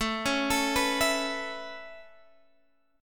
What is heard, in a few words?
A Major Add 9th A Guitar Standard, chord; Aadd9 arpeggiated; JGuitar